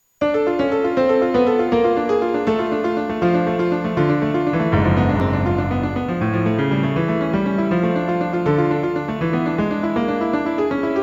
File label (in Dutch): Muziek; Dodenhuis